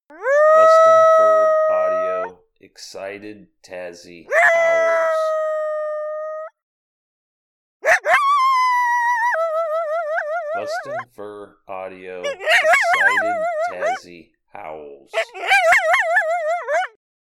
Excited Howls; Tazzy

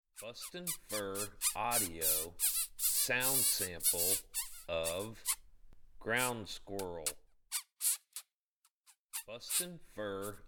Ground Squirrel

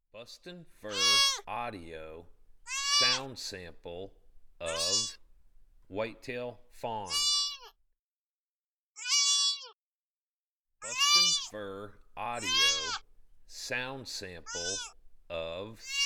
Whitetail Fawn

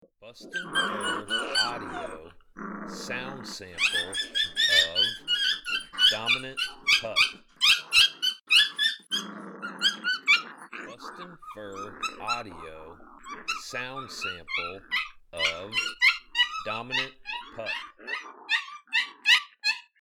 Dominant Pup

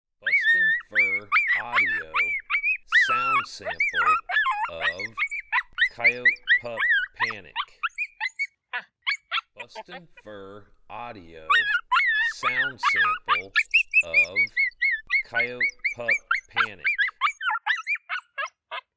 Coyote Pup Panic

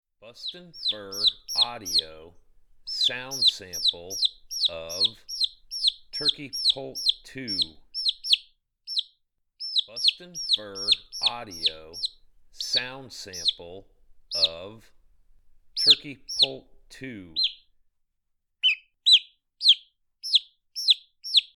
Turkey Poult

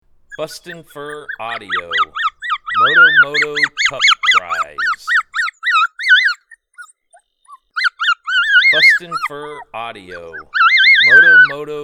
Pup Cries; MotoMoto

